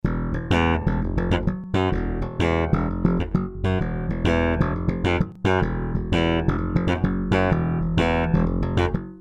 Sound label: Slap